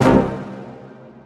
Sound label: brass